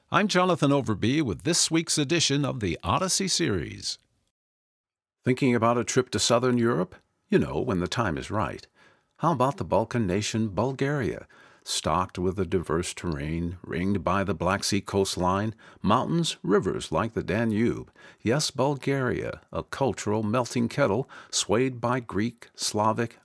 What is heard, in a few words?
bulgarian folk vocal; The Soulful Sound of Bulgarian Folk; WPR